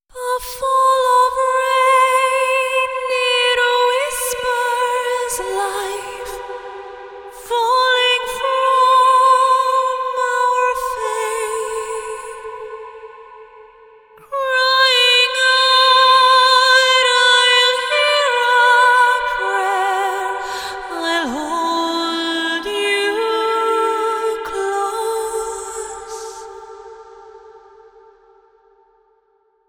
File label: Top Line Vocal - Am 70Bpm WET; Top Line Vocal 2; Construction Kits; VOCALS; Ghosthack; SkyRealm; Kit 01 - SkyRealm